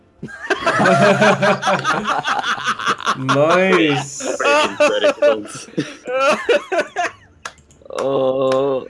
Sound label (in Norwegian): guttalatter